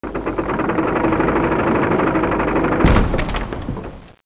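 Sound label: doors up; Renaissance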